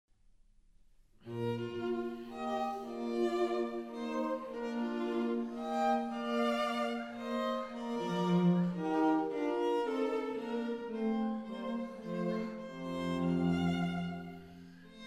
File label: trio